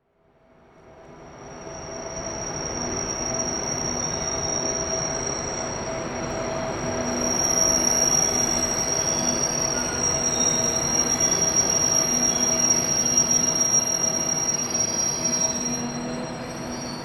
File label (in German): ICE